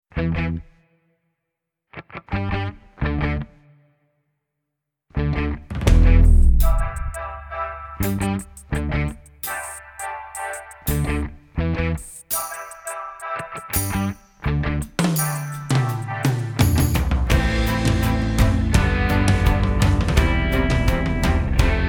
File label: Instrumental; Audition Cut